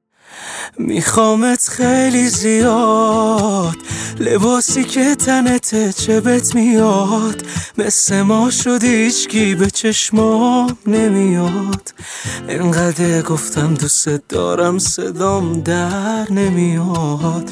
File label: Guitar Version